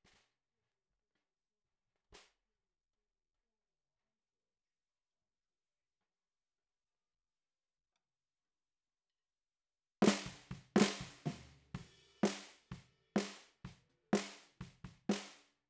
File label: SNR BOT